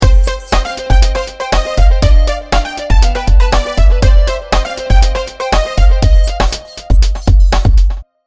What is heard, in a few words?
jingle